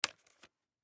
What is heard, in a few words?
Hockey Helmet Strap, Hockey Helmet Strap Unsnap; Hockey (Sound Effects:Sports)